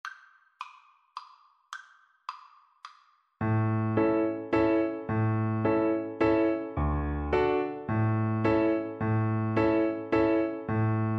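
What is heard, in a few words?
VLC karaoke, Cello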